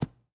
arrow dirt, attack impact

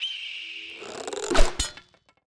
Tower, Complete; 0AD; building Completion

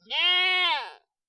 goat, death; fauna

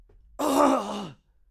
male death

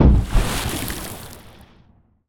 seige impact water; ships siege

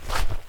fs rock, singlesteps

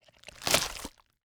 gather meat expire

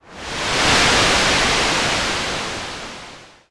ship move

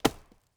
lumber tree

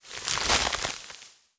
gather fruit expire

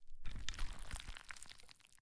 gather meat

